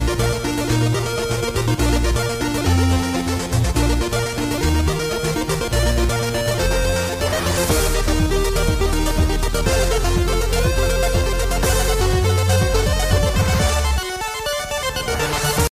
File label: 8 bit; amphibia